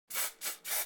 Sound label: air spray